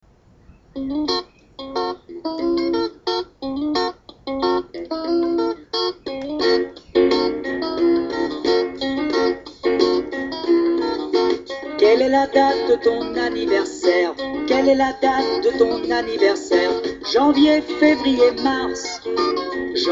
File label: birthday song